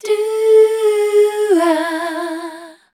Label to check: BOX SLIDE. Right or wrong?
Wrong